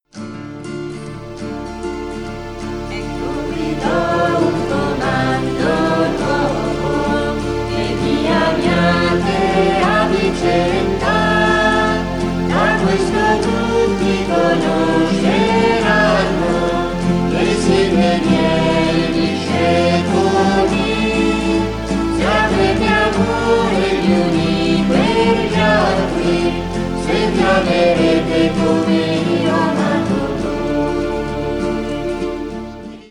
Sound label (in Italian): Canto